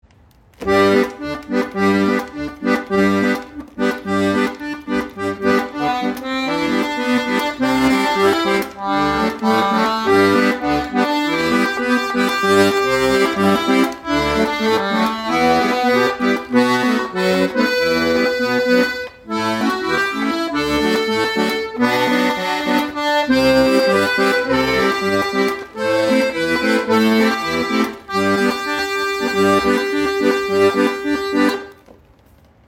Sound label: Polka